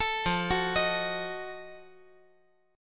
F 7th Flat 9th F down

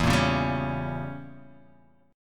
E Diminished 7th E, Guitar Half Step Down

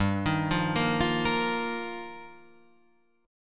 G Minor 7th Sharp 5th G, Guitar Half Step Down

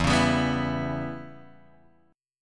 E Minor 6th guitar chord, Drop D

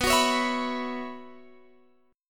B Suspended 2nd Suspended 4th, Guitar, Open F; Bsus2sus4; strummed

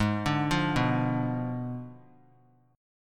G sharp Diminished 7th; arpeggiated; 20,21,20,21; 3C,3F,3A,3D

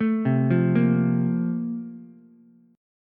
A sharp Major 7th Suspended 2nd, Ukulele; A#M7sus2; arpeggiated; JGuitar

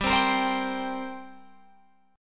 G Minor Double Flat 5th, G, Guitar, Open F; strummed; JGuitar

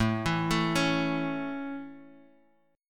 A Major, Guitar, Drop D

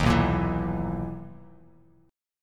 B Major 11th B Bass Standard; BM11; strummed; JGuitar

